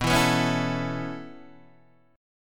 B 7th Suspended 2nd Suspended 4th down Guitar Open D, chord